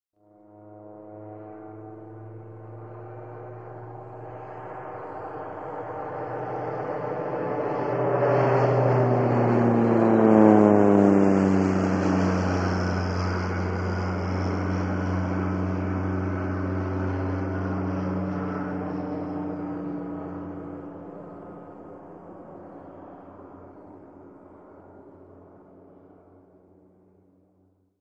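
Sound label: Prop Airplane By Small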